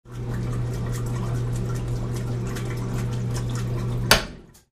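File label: Washing Machine Rinse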